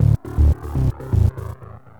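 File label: scratch bass; Loops; Electronic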